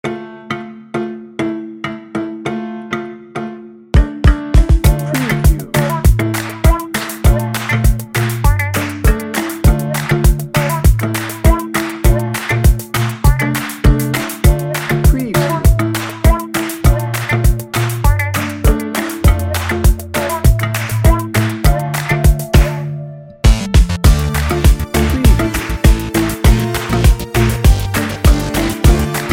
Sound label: friendly vibes